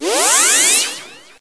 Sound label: Barrier; ycrpg